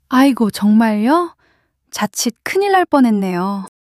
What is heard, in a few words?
surprise